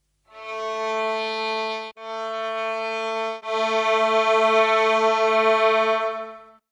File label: Geige, mikrointervalle